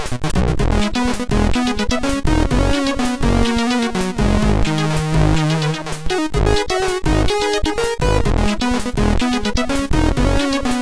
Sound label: test tune, sid MOS6581R3